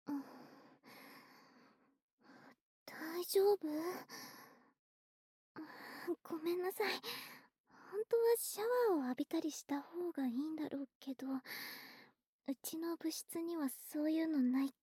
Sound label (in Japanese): 寝かし付け 耳舐め マッサージ; ASMR